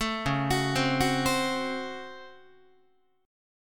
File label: C sharp Major 7th Suspended 4th Sharp 5th, Guitar; C#M7sus4#5 arpeggiated; JGuitar